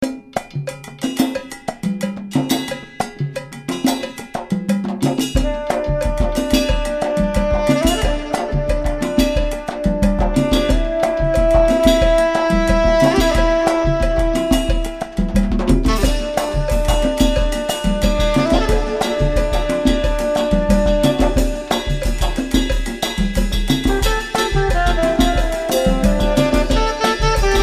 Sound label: jungle; jungle stream no piano